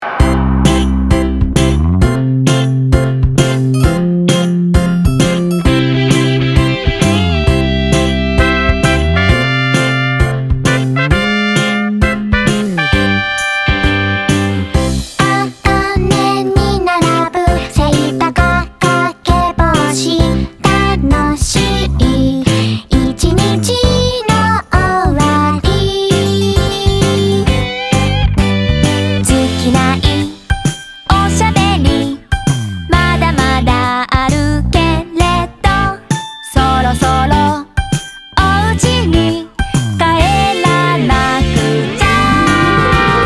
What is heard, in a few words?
Humming Girl; A Channel